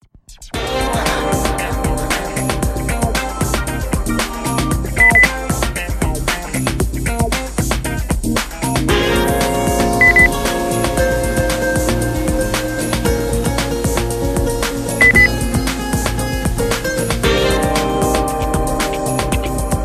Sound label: groovy